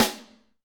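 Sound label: Snr R-Shot RM, snare; Alesis D4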